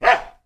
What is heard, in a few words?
dog